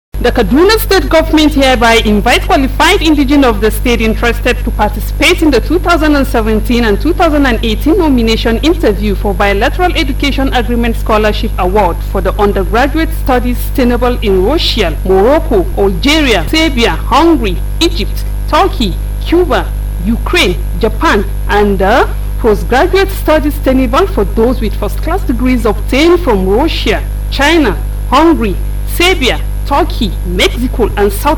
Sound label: ENGLISH JINGLE